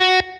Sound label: solo Staccato